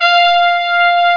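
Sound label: french horns F5; Star Trek JR Audio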